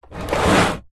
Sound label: wood box heavy slide concrete